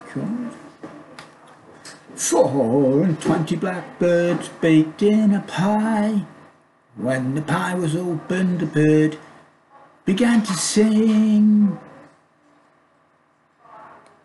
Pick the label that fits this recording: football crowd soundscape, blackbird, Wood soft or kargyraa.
blackbird